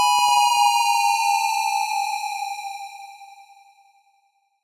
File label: fall; deltarune